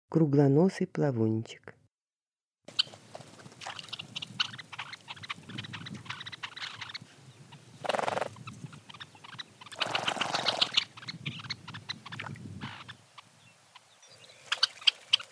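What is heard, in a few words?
red-necked phalarope voice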